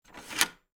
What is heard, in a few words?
Cassette out